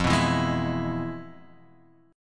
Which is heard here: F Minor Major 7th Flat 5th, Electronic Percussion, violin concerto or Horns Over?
F Minor Major 7th Flat 5th